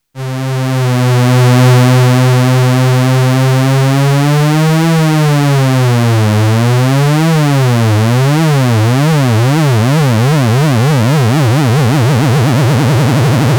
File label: XP LFO, Roland alpha juno synth